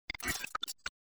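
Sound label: glitch pixel; افکت صوتی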